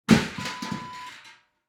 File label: garbage can lid close